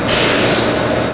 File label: loader hydra, ambience